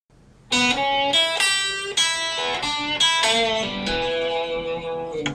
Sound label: e blues lick squeeze it, guitar